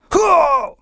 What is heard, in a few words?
hurt; True Crime Streets Of LA; XBOX